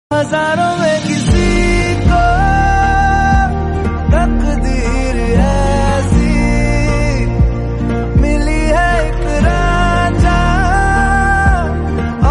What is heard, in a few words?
ringtone bgm full romantic ringtone; kalank